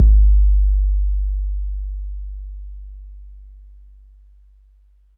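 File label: bass drum 808 MPC60 Smooth G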